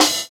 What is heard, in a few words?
Snare, jungle breaks drum sounds